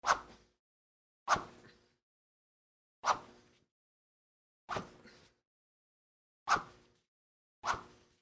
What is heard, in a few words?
karate sword swish